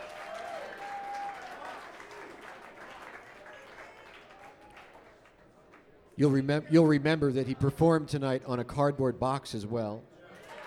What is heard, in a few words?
tuning